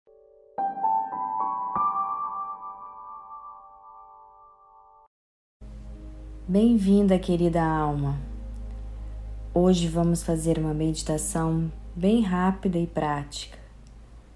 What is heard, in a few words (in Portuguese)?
audio meditar